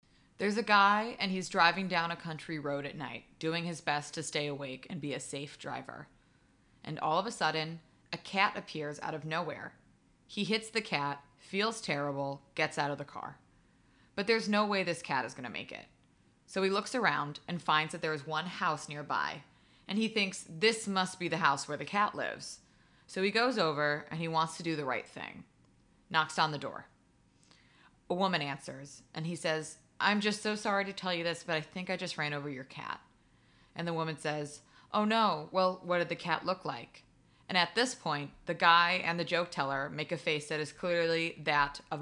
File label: Laugh Track; Allison Silverman; Joke